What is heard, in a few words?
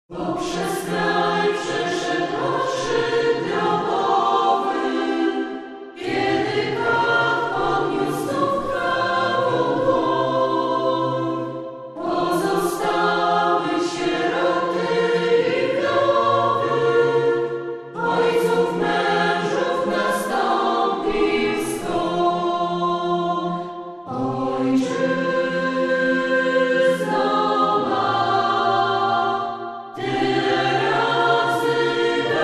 acapp